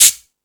Percussion, Drum Machines E-MU Pro-Cussion

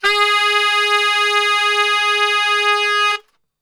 G#2 SAX STR

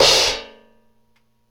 Big Choke Crash; KJ Sawka; Drum n Bass & Beyond; Drum Tool Box

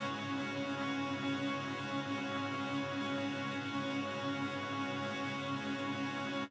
pad; M8

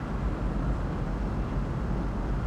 Ambience Loop, outdoor

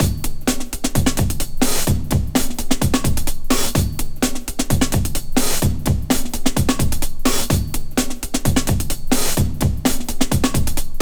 128 Breaky Bins, breaks; Lockdown Breaks

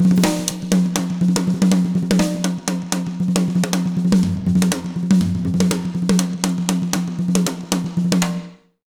BEAT, Jazz Latin Drumloops

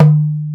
DUMBEK, ETHNIC PERC; InVision Interactive; Lightware VOL-1

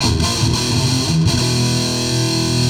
Riff (lick), Industrial, Nasty

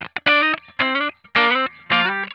GLIDE, Funk Guitar; Zero G - Funk Guitar

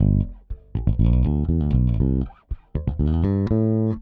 Pop, Bass

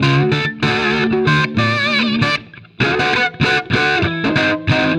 BLUES GUITAR; Sonic Foundry (Sony Creative Software); Vital Drums

